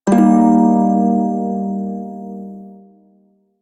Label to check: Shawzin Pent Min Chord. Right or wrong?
Right